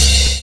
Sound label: LIKE 808